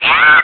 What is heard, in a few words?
Spin Attack; Link's spin-attack shouts; Voice #4: Spirit Tracks